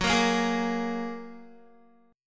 Gb Major Add 9th, down, Guitar; Gbadd9 strummed; 4F,4A,5C,5G,5C,6E

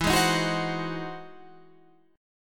E Augmented Major 9th, Guitar, Standard; strummed; E+M9; JGuitar

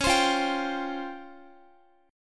Db Major Sharp 11th Db down Guitar; DbM#11; strummed; tuning=4F,4A,5C,5G,5C,6E

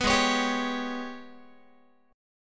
Ab Minor Major 7th Double Flat 5th Ab, Guitar; AbmM7bb5; strummed; chordshape; 14,16,16,17,17,x

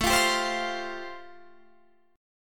A-Minor 7th Sharp 5th, Guitar Standard, chord; Am7#5; 17,20,17,17,18,20; strummed; capo=2

